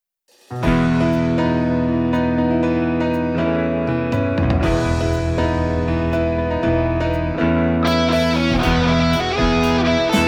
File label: Exit Music